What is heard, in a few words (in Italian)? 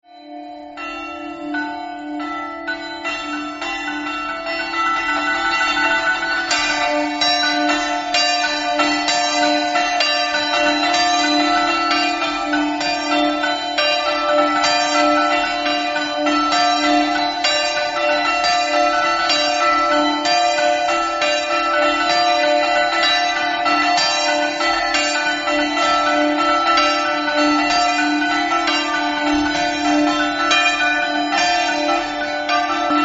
campane; melarolo